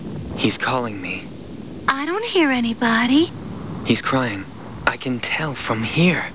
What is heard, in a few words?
crying; Trowa